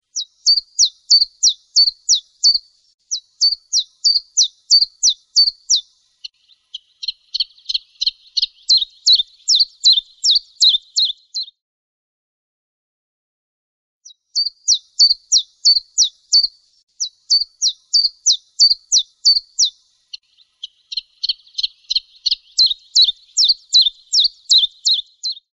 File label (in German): Vogelstimmenspiel Zilp Zalp